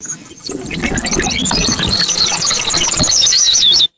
rapid synthetic fluttering ui sweep

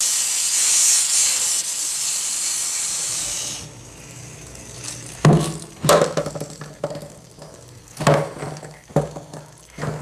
egg roll sliding down metal pipe

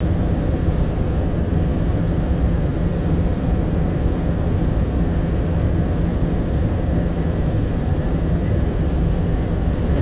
steady jet airplane interior cabin drone; aircraft

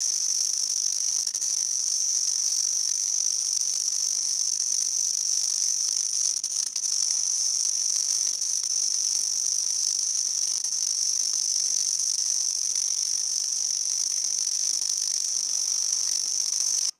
rapid rhythmic insect clicking like a sprinkler; animals

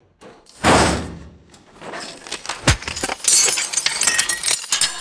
heavy metal drop and crunching debris; destruction

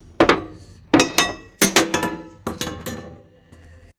hollow metal object dropping and bouncing

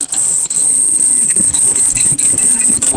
small tin wind up toy whirring

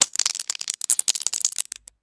handful of pistachios dropped on countertop

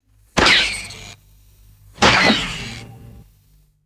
two comical springy cartoon punches

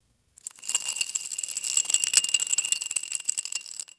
coarse spice flakes pouring into glass flask

